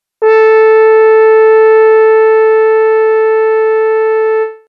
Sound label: horn